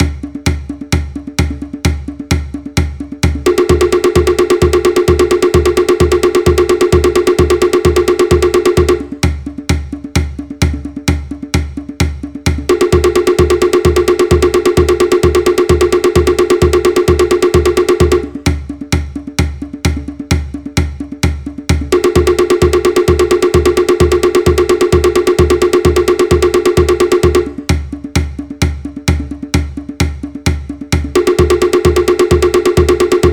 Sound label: OTEA BOYS SLOW, toere